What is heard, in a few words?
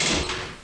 Click On